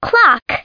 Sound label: clock